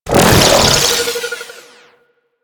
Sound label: Riot Moa Shield Deactivate; Warframe; Attacks